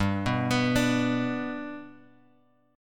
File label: G Major G, chord; G Chord {3 2 X 4 3 X} arpeggiated